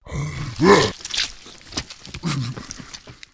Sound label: zombie attack